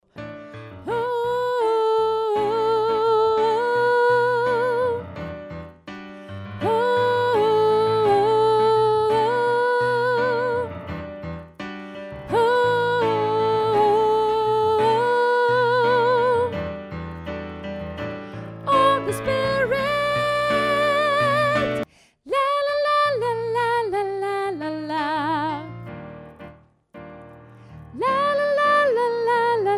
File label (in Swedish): tenor, stammor